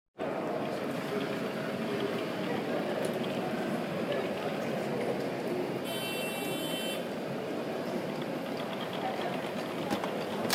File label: escalator; katsucon 2015